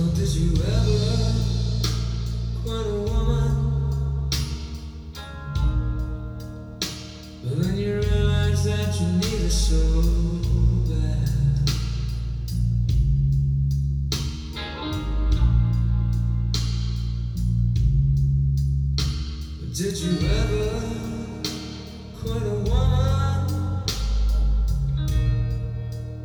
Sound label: blues